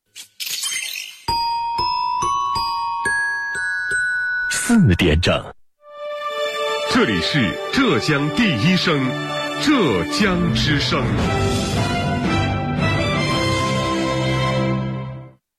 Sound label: jingle; vo zheijiang